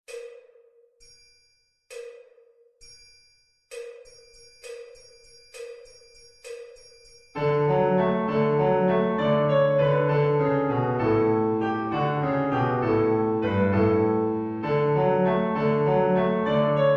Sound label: drumming sessions